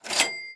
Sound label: cash register up; netent